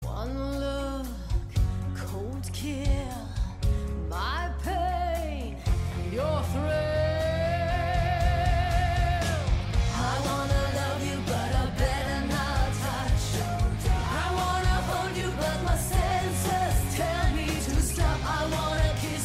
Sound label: rock medley